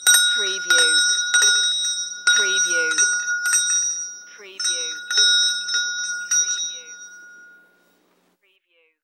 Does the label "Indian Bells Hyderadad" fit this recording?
Yes